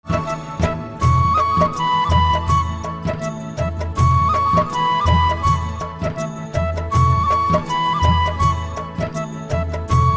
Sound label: Flute